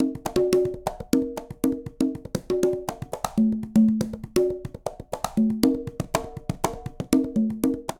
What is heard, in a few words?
Mozambique Quinto Conga Tumba 120bpm, Latin Percussion; Ableton; Factory Packs